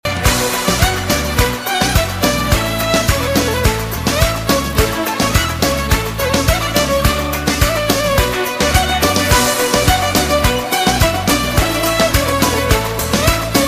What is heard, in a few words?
ringtone